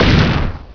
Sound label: SHOT; homm2; FALLEN